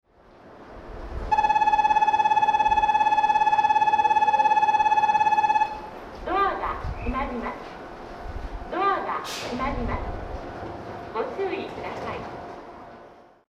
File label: 2 bell; noda; osakaloop; jrwest